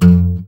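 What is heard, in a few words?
GUI Ac Dmp F, acoustic guitar; Best Service Gigapack 2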